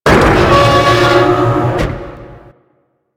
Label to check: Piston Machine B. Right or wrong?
Right